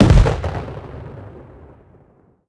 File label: explosion close; World of Tanks Blitz